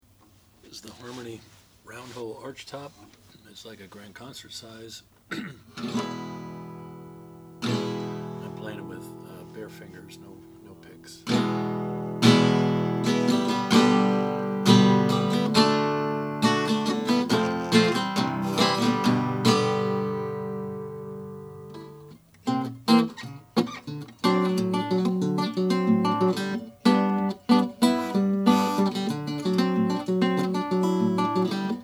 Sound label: harmony round hole archtop sunburst